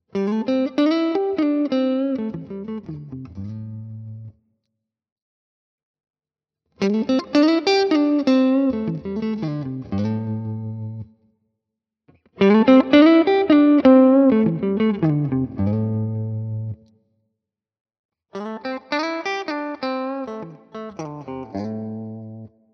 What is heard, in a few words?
Ibanez AR520HFM LBB clean all positions licks